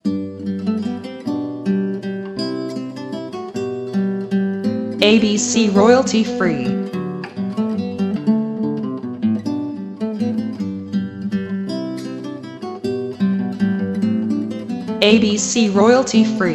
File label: Minuet G Major